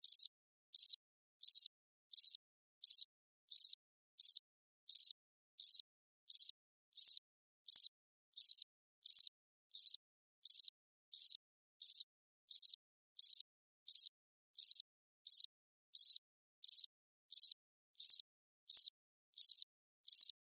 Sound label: Insect Cricket Single Chirping Loop; Insect Voice; Animals